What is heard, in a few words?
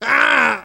hurt minor, survivor voice